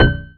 Klank

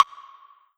Yamaha TG100 High Wood Block

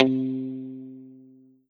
Kurzweil K2000 Guitar Mutes C3

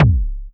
Yamaha FS1r Tekk Set Kick

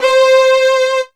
E Mu Proteus FX Fiddle C5

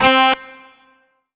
Charang C4; Korg NS5R

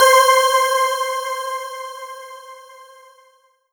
Roland SC 88 Brightness FX C5

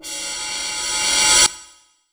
Roland JV-2080 Reverse Cymbal

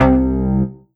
Alesis S4 Plus Shark Bass C2

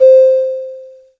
Yamaha PS-300 Piccolo C5

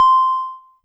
Yamaha PS-55 Music Box C6; Synthesizer - Bells

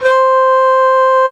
Casio CTK-611 Shakuhachi C5

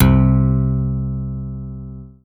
Roland JV 880 12 String Guitar C5

